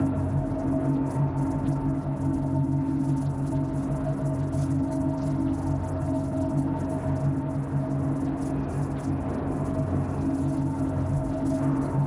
neuron calculator phase 1 idle; Stellaris; machineage; superstructures

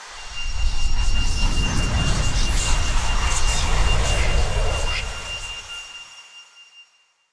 psionic comms; Stellaris; astral planes

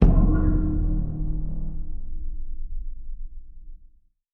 notification ethics generic; Stellaris; paragon; notifications

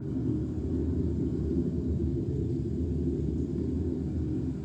dimensional portal idle; Stellaris; other entities

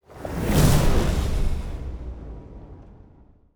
transport ship move; Stellaris; aquatics; ships

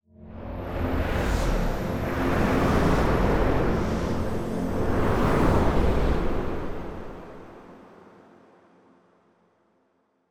rift event gaseous clouds; Stellaris; astral planes; rifts

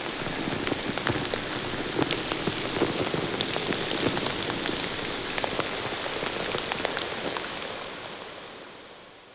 cleansing ambience; rifts; Stellaris; astral planes

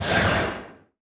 distance laser turrent fire Psst; Stellaris; weapons

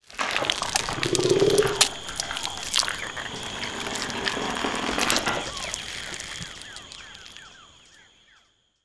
wriggling eggs; rifts; Stellaris; astral planes

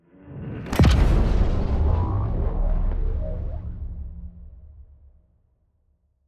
ship move; juggernaut; Stellaris; shroud

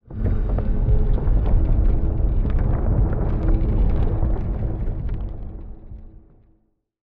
grinding stone; Stellaris; astral planes

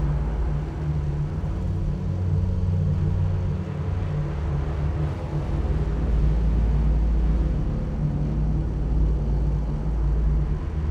ship construction ship idle; Stellaris; toxoids

